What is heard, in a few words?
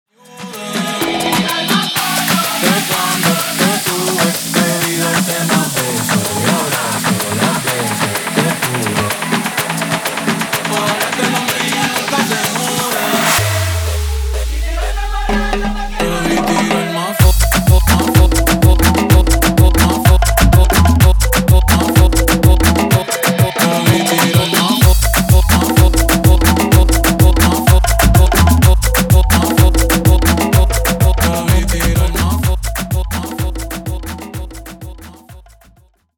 afro remix 126 bpm